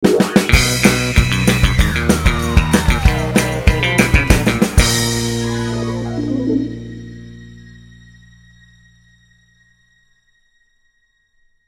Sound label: Energy Dance